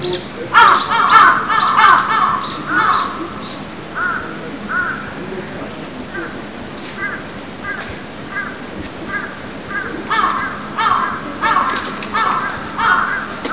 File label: crow; karasu